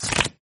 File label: Card Flip Many